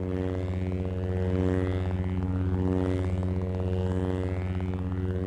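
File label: sith lightsaber hum loop; weapons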